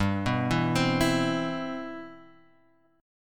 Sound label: G 6th; G611 Chord {3 2 2 5 5 X} arpeggiated